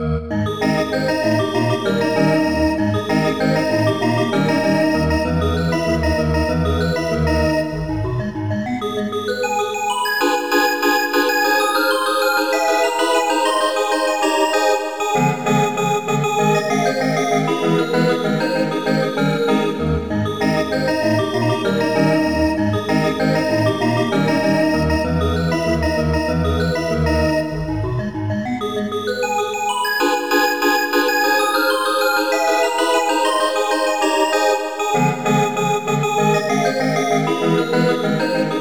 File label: maple rag; Windows 95 - Sights & Sounds